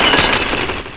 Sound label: E CRACK; Strategy Companion